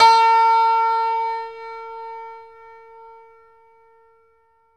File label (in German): HACKBRETT A#; Best Service; World Colours